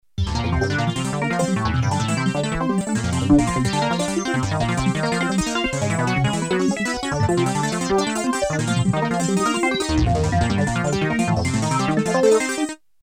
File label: synth bass, Synth: synthbass, Roland JV2080